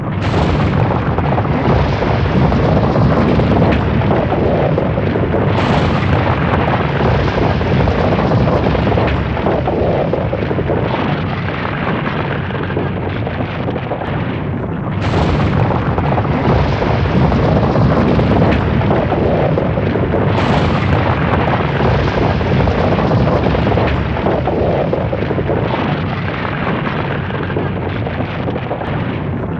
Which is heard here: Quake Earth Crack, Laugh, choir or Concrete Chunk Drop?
Quake Earth Crack